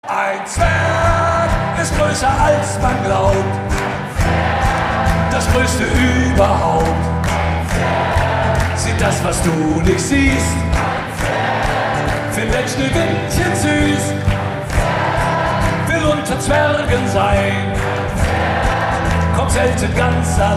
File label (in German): zwergelied